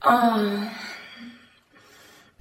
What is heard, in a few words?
"oh", orgasm; ooh ahh